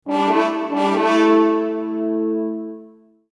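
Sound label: fanfare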